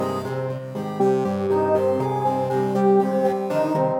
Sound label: Synthetic Line Cmin; Ambienza